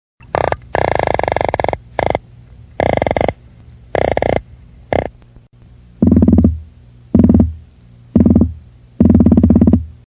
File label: croaker